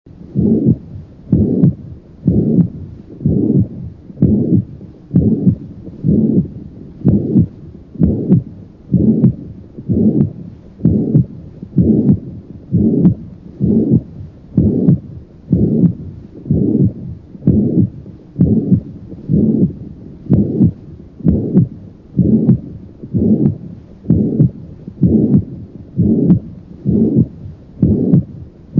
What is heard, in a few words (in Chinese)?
二尖瓣关闭不全